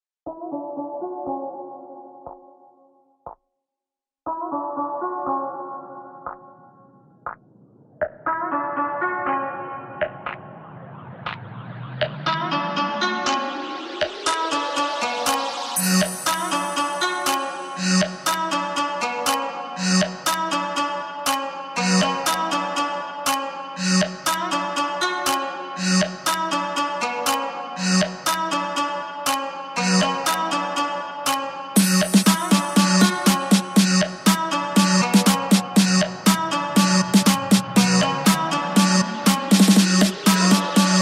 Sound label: dubstep; mixkit